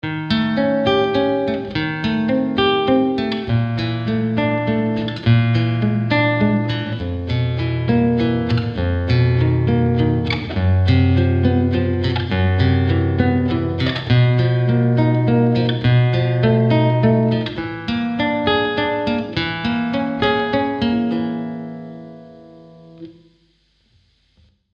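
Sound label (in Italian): Esercizio, Chitarra sola; Guitar Club Marzo 2025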